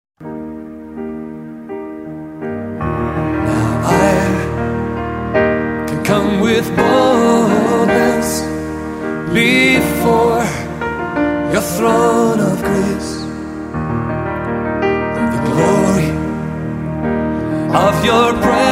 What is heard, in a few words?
Piano